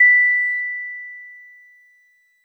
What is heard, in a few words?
DreamLike DX100 B5